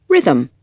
rhythm

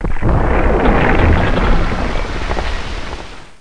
crumbl; duke nukem total meltdown

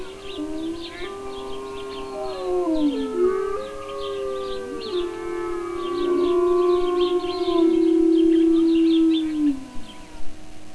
Wolf; Ghost Wolf windows open

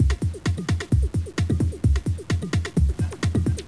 loop

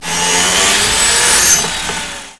Saw Startup; Hamsterball

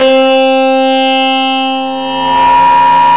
grunge guitar

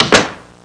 door close door close

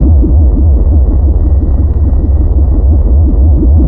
airstrike hover; darwinia demo

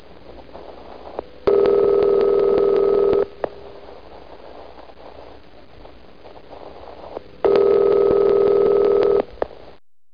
phone

